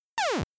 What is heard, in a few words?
Ship Fire; Asteroids